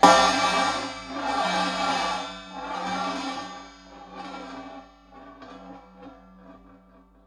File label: Raw HiHat, contact mic